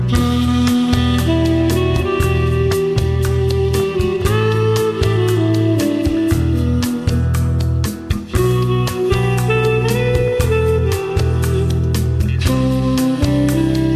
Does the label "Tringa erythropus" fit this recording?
No